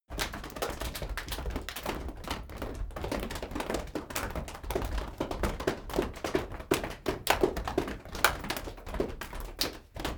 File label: crowd chest hits